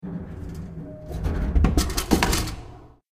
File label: soda can vend